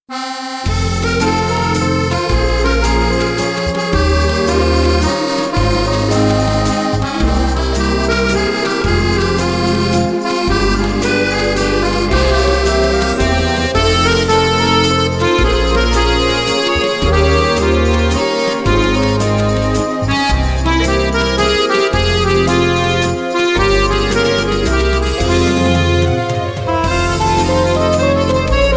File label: soft waltz